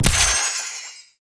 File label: mag spell